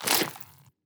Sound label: Gore; Damage